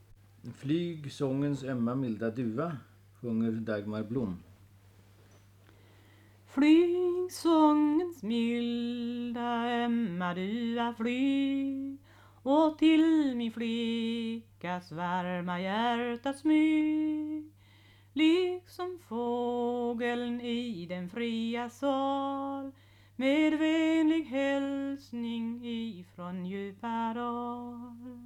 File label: Folk music of Småland; Flyg sångens ömma milda duva